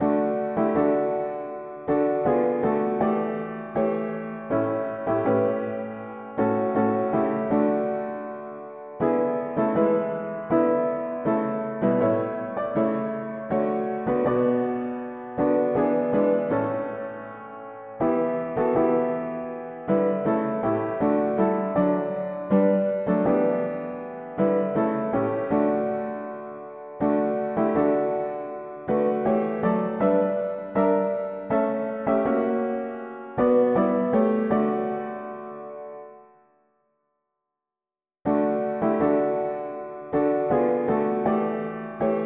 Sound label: mono piano, hymns; Here around Thy table gathered